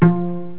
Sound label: pluck